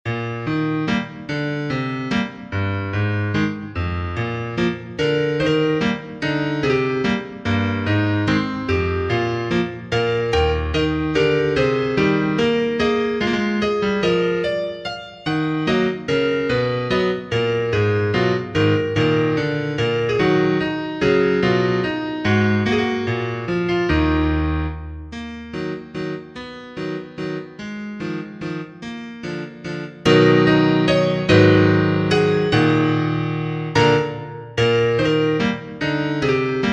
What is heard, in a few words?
Italian Waltz Piano Piece